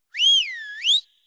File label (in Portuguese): assobiar